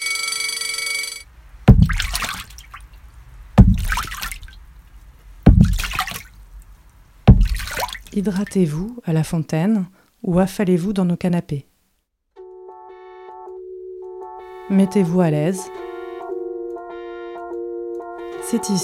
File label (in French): coin chill